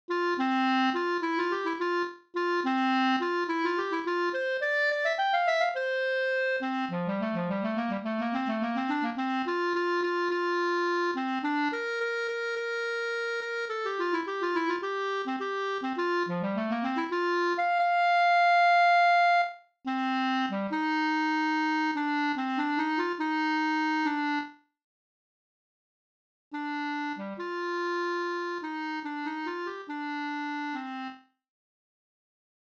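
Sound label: Bb clarinet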